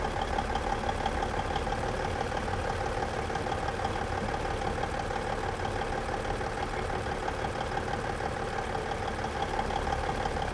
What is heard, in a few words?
vehicle semitruck idle